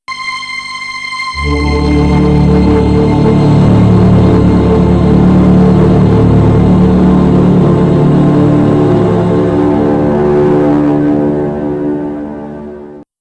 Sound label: Windows Beta Shutdown; Windows Never Released Soundpack 1